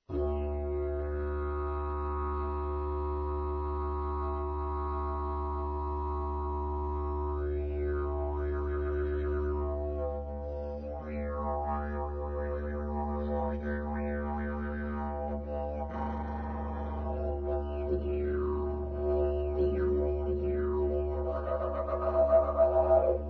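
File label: pvc D, didge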